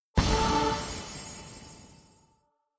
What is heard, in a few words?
bonus card; Skillzgaming; battle royale desktop